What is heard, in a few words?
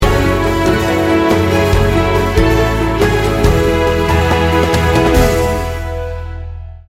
teamwork sting bumper